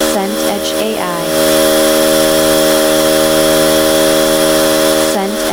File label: leaf blower